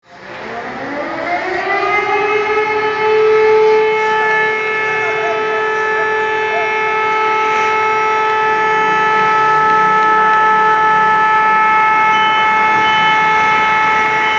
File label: greece eas alarm real